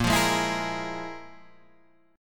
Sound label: F Minor Major 7th Double Flat 5th